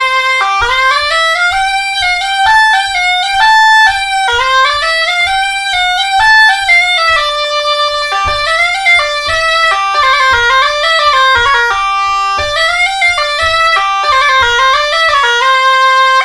Scottish Valse; Scottishs

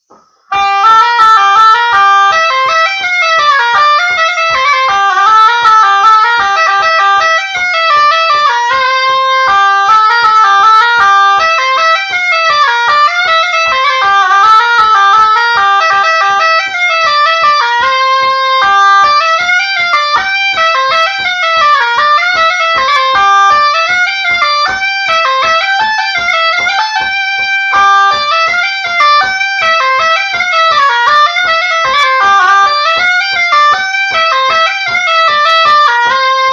La Bourrée à Poulou; Bourrees

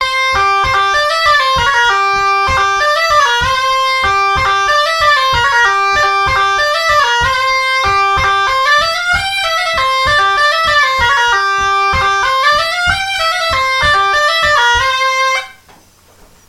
Ballade Auvergnate; Bourrees

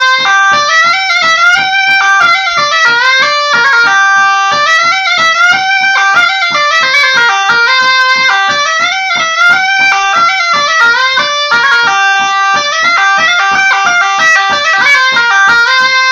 La Bourrée à Pradal; Bourrees

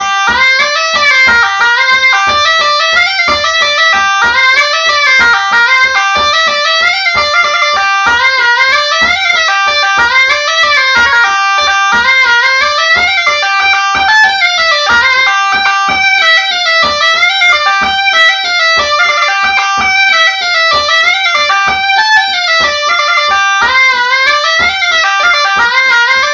La Bourrée à Costeroste; Bourrees

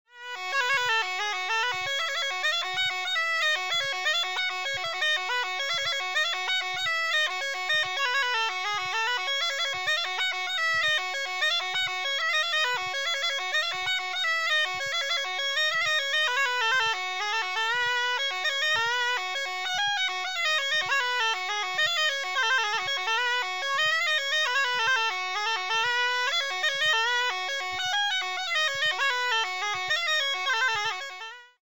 La bourrée à Bonal; Bourrees

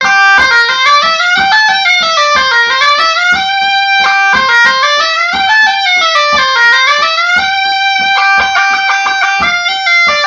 La Bourrée du Cantal; Bourrees

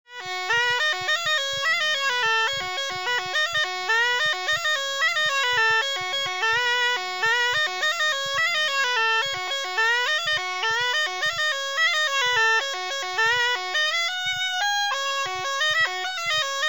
La bourrée de Royat; Bourrees

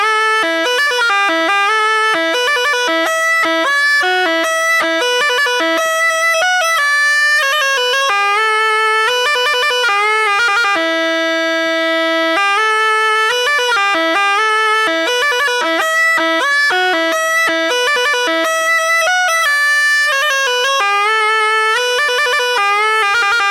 Melodie de Musette

